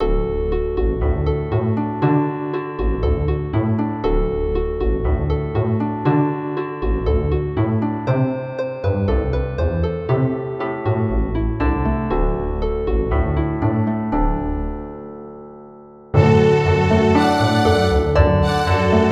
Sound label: folk